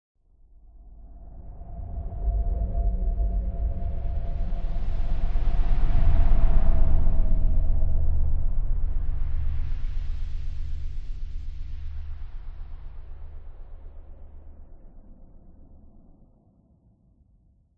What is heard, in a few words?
science fiction spacecraft slow fly by; Audio Mangler